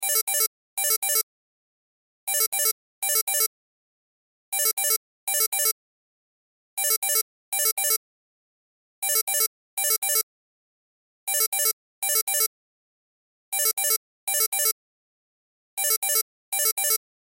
Cell Ringtone

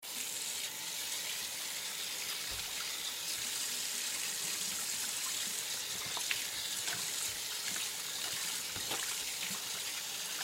Household Bathroom Sink Run Water; Sink Run Water Clean

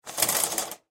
Household Shower Curtain Open Fast, bathroom

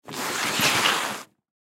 Lacrosse Equipment; Lacrosse Equipment Bag Slide Cement; Sports

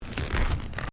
Footsteps Carpet Step; Crocs; Footstep Foley; Footsteps (Sound Effects:Human)

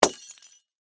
Impact Glass Flood Light Bulb Smash; Materials

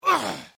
Male Grunt Human Injury Pain Vocal Ugh Ouch

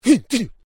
Male Vocalization "Hun Cha"; Male vocal sounds; Exclamations (Sound Effects:Voice)

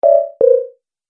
Multimedia Error, beep; Business Programs, Apps And Computing Noises

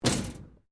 Metal Plate Impact; Materials; Foundation

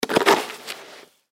Sand Scoop Pail, Sand Scoop Pail Toy; Playgrounds; foley; Blastwave

